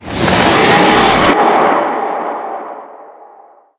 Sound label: teleport out; doom3